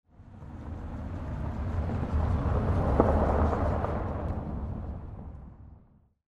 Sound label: Car Drive By